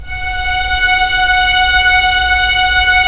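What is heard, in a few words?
STR SC, strings; Roland - LA Composer vol. 4; Full Sec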